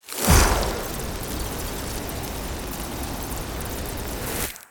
ice breath; quitoypongo; snow cursed deer

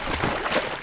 slosh, footsteps; Garry's Mod; tf2

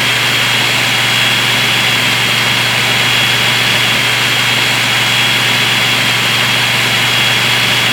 sawblade; Garry's Mod; tf2

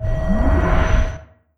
points return; Garry's Mod; tf2

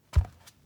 step; brainrot; la vaca saturno; saturnita

